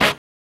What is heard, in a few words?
Perc Brass; SSO Percs